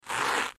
Quick Velcro Rips

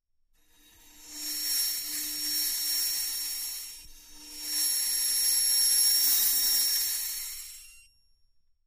Bells In Cymbal Bow, cymbal & gong; Mini Bells In Cymbol Bow

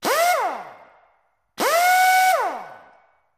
Wrench Pneumatic

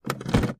gearshift

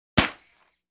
Paintball Guns Sing

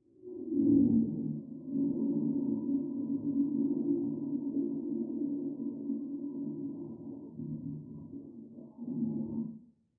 Toilet Flush Water, pipes

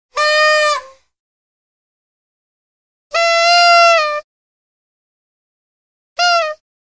Party Favor Raspy, horns

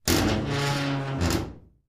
Vault Outer Door Open Slow; safes & vaults

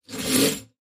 bowsaw stroke

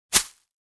Foliage Impact Soft; hits & impacts

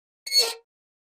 Metal Scrape On Metal

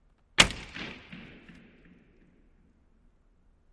Hockey Player Stick

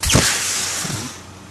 Future Gun Shot Sing, futuristic

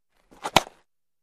Baseball Bat Alumin; baseball fx & games

